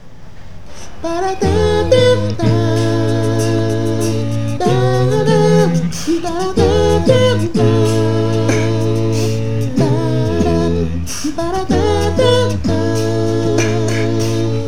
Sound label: jazz theme